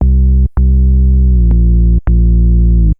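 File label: synth bass, drum and bass, 160 bpm, C; eJay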